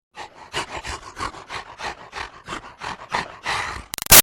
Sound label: Creature Demon Breaths Hungry to Kill; Monsters; Horror; Big Room Sound